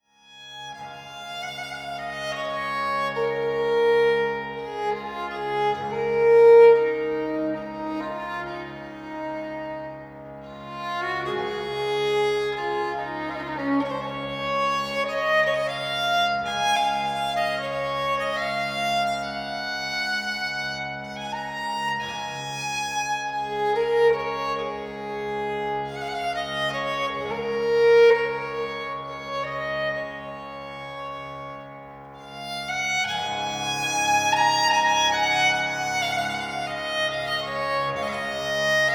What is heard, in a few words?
Scots Lament